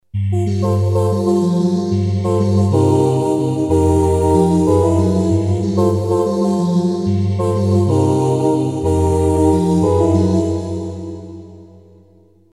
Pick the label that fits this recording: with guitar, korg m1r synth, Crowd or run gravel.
korg m1r synth